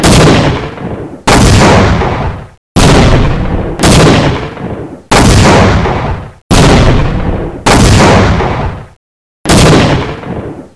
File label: massive explosions close; cs halflife facility